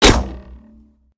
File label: bullet small crossbow bolt; gmod; viper